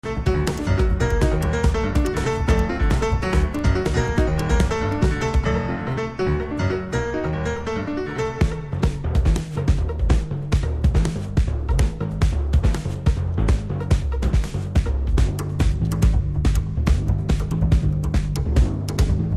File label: ground bass; Deutsche Grammophon